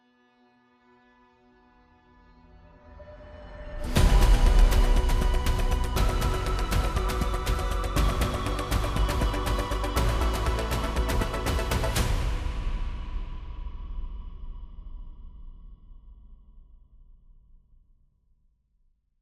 Character Select, Solo Mode; apex legends season 12; Catalyst

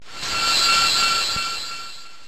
Power Gem

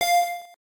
ball drop; Pokemon Reborn E19

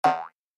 Jump; Red Ball 4 Vol 1

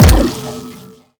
Sciweap Shot Beam Small Thermal Defibrillator

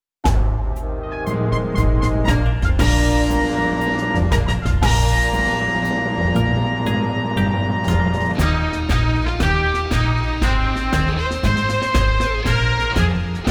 Victory Song; shoujo yoshitsuneden 2 toki o koeru chigiri

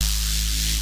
Laser Continuo; Neon Souls; PS4 PS5 switch windows xbox one xbox series xs

